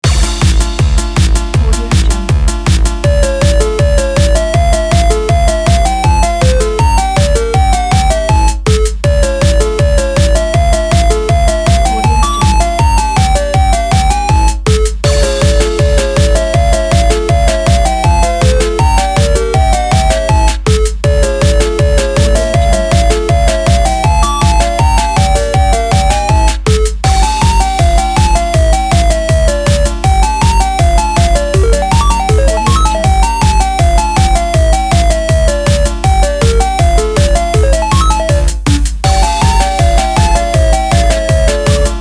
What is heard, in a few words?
8bit game winner